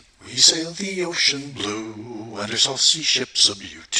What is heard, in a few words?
Pinafore Chorus